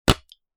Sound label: Wooden cup Cheers, alcoholic drink